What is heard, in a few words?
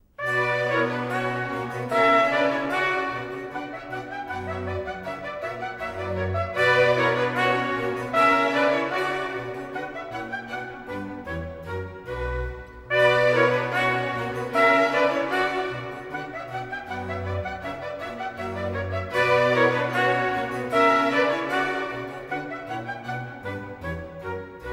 Orchestral Suite No. 2 in B; Franz Liszt Chamber Orchestra Budapest; Bach JS; B Minor